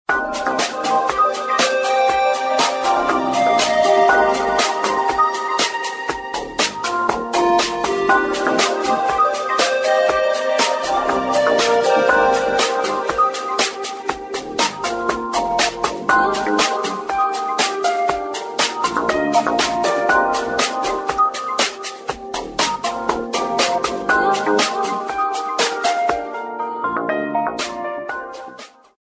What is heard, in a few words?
midi ring